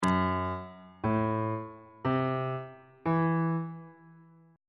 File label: e double sharp half diminished 7th chord on bass clef